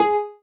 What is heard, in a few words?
marimba rainbow